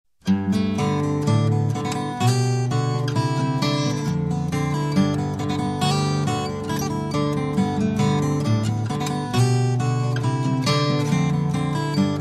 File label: acoustic; the sunny bank